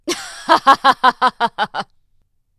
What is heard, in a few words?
female laugh; CosmicRage